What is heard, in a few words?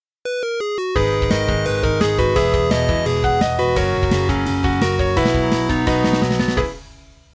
Piano